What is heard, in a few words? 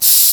Foley Fizz Hat; essential drumkit